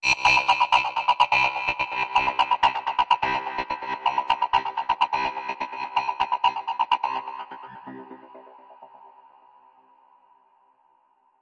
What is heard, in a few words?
126 space banjo